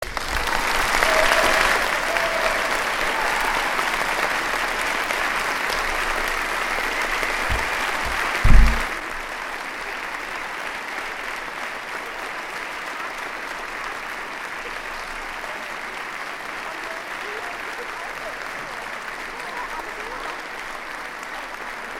Applaus; Blechblaeserensemble Elijah Rock

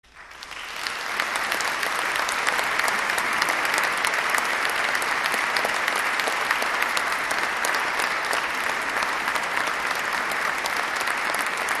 Applaus fuer Unterstufenchor Chor und Jubilaeumschor; Konzertmitschnitt vom 20. Dezember 2016

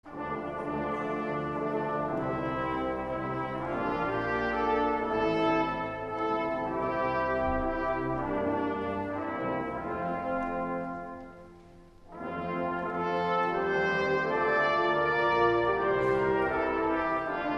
Blaeser und Orgel und Publikum

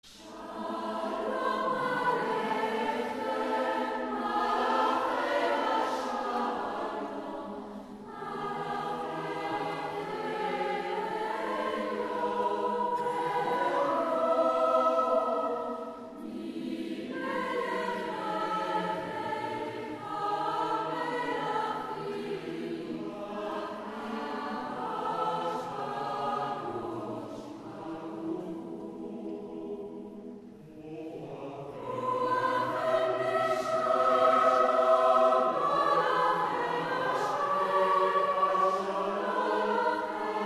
Chor und Jubilaeumschor; Shalom Aleichem Traditional

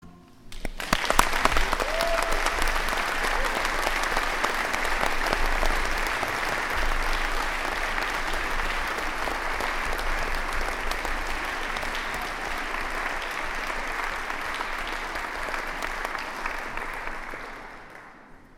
Unterstufenchor Applaus

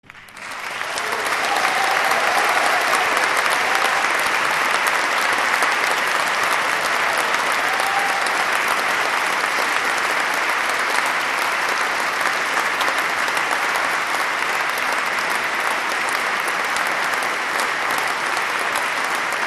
APPLAUS; Orchester; Egmont Ouvertuere; Ludwig van Beethoven

